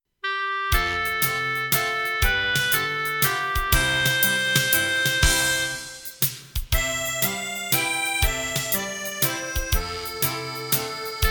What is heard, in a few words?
Waltz acc pno cl or fl guit dr